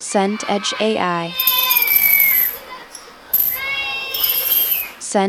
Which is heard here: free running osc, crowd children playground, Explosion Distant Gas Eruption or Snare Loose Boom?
crowd children playground